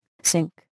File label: sink